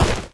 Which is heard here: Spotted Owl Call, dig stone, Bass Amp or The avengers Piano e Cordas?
dig stone